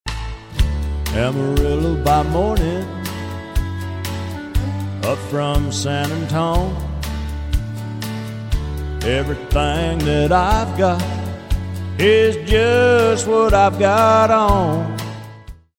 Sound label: Sings